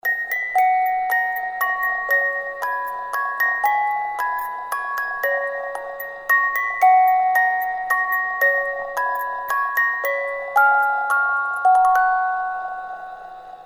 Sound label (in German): Melodie Kommt ein Vogel geflogen, music box round dance with 18 note musical movement; Wendt & Kühn